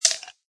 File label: plastic ice